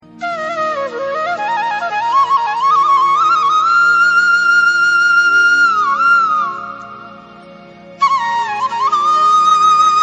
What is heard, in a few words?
flute morning